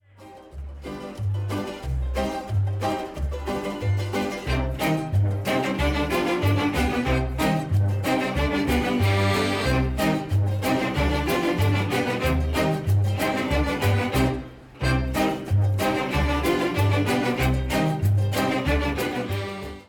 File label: rumba